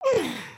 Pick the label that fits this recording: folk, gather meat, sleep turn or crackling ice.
sleep turn